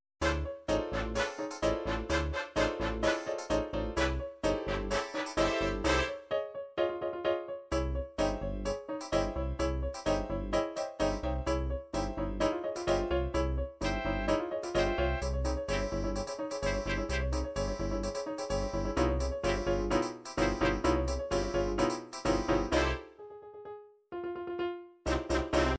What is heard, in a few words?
cha cha, midi